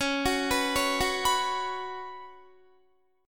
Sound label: Db 7th Suspended 4th Db down Guitar; arpeggiated